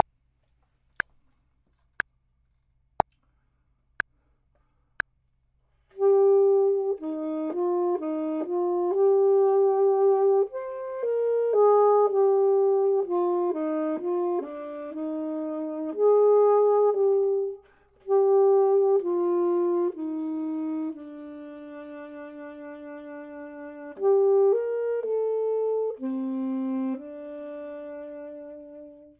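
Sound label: bottom, Saxophone and Clarinet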